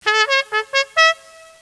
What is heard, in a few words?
horn